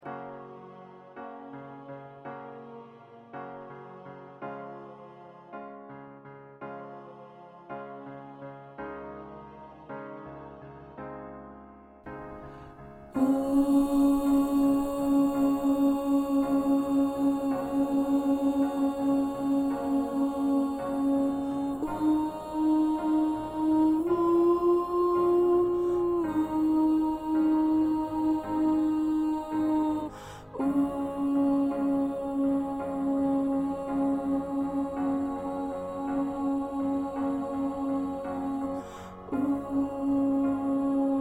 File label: Alto, choir parts